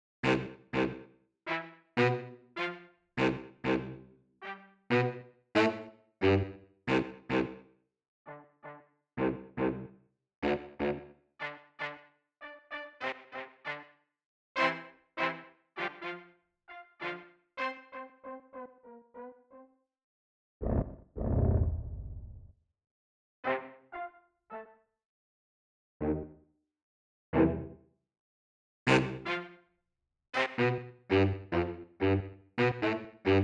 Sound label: Hot Brass